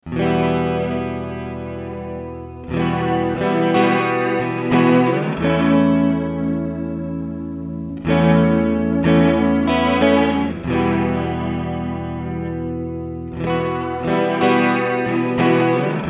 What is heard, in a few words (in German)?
Binson Echorec E Gitarre Swell short Speed Modulation; T-REX